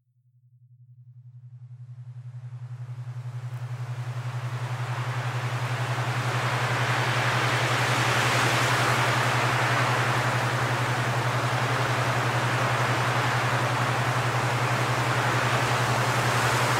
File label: Pure Relaxation High